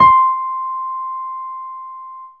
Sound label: PIANO, Dance Mega Synth; Best Service